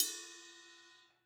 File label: Ride Choke, Cymbal; apulSoft apTrigga2; Multi-Velocity Cymbal Samples